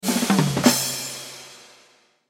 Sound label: build ready; New Yankee in King Arthurs Court